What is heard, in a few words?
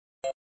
Menusound System Plus; System Plus (next); flipper